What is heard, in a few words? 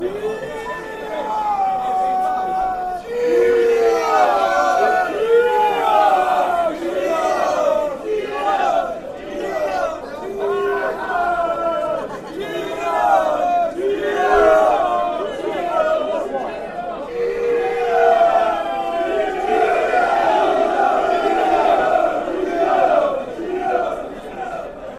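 gillingham, football chants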